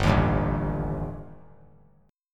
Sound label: G Suspended 2nd Sharp 5th; Gsus2#5 strummed; JGuitar